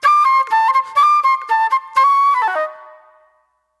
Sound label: Soul Plate Flute